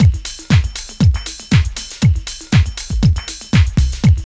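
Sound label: drum loop; X-Static Goldmine 3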